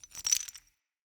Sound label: Smithy Rock Crumble; Ambience; Soul Plane